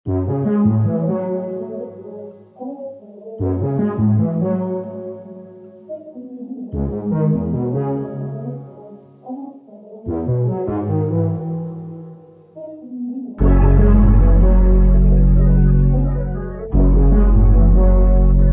144bpm C minor

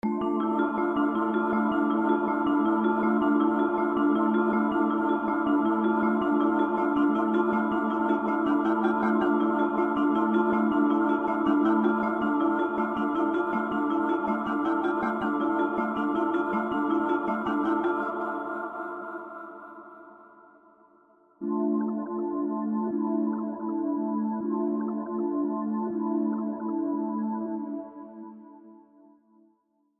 Moncler Puffer 160 D minor street dark

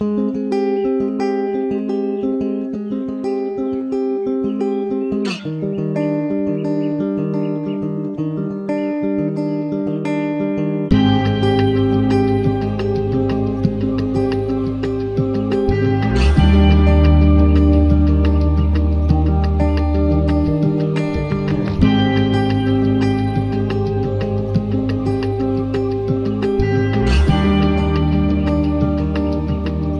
176bpm C min

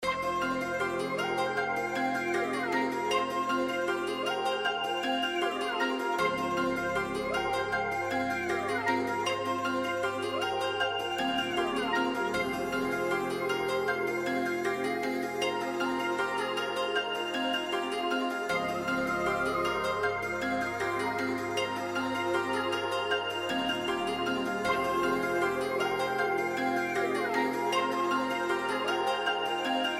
Fm 156 hard melodic

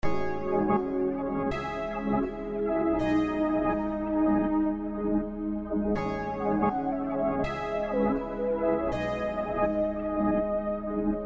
162 C minor synth epic

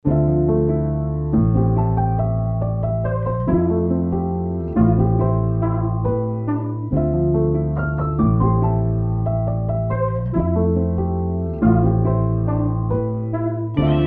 140bpm A min

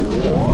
railgun

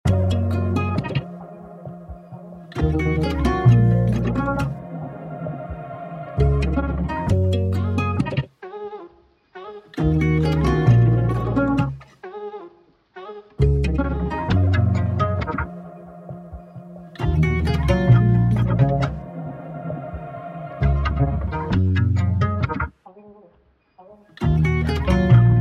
133bpm C major

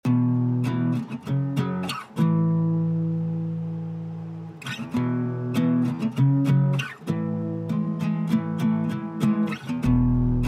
98bpm E maj

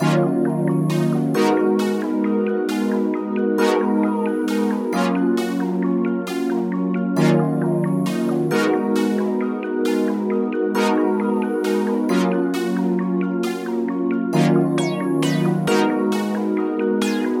134bpm D min

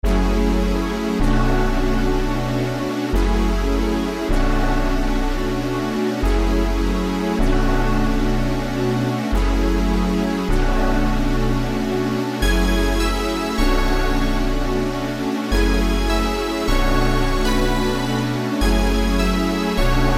155bpm F min

